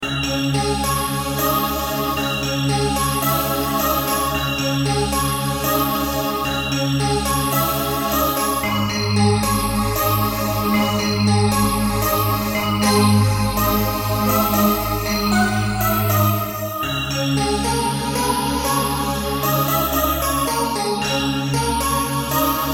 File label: Organ - Mystic